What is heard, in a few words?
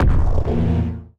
giant footstep